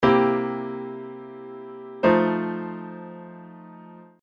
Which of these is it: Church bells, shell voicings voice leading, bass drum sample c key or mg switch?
shell voicings voice leading